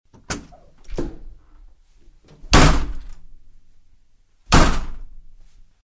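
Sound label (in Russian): звуки дверей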